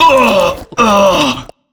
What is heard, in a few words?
choke Male, HUMAN